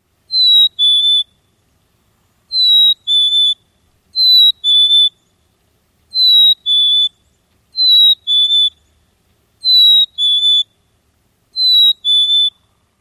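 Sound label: Black-capped Chickadee song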